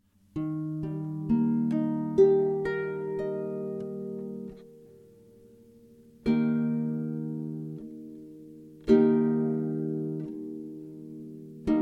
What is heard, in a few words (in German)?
Akkorde Es C